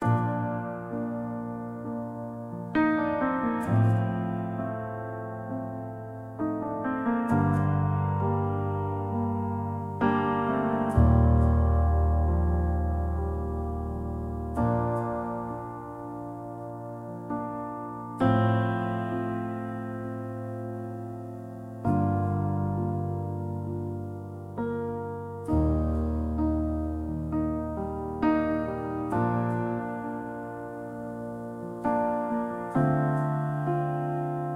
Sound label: Piano Prelude, Natural Stereo Reverb; Stereo chorus effect plugin